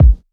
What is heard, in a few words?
sharp kick drum sample a key; kick drum samples